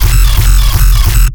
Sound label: Sound FX Bass